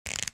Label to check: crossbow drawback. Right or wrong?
Right